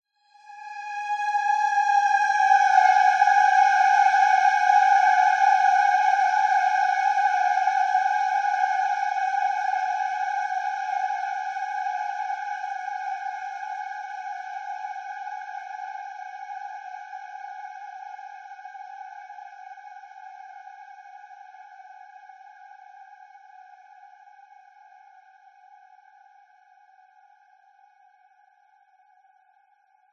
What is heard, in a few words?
horror swell long ghostly discordant; zapsplat